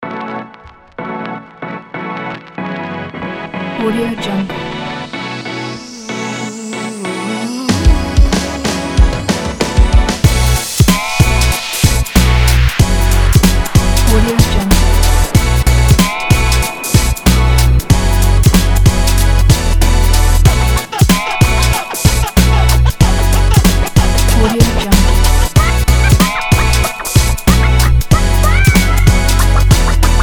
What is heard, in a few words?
hiphop